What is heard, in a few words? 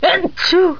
sneeze; Casio Colordesk Slides and Sound 1997